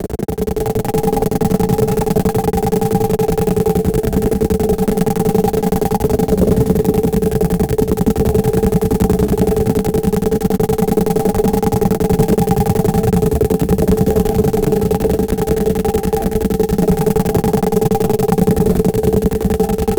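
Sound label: a balanced rhythmic brainw, brainwashing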